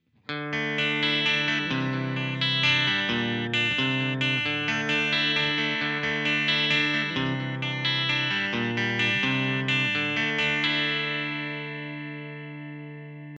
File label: clean phone neve